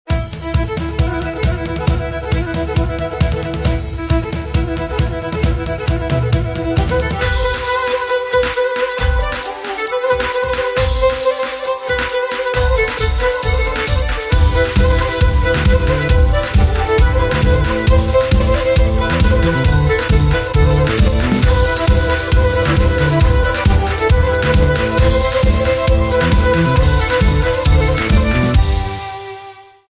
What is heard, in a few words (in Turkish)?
Kemence Ile Jingle Bells; Yeni Yil Sarkilari